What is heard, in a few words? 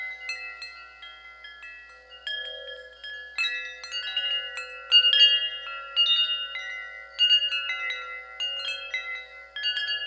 large chime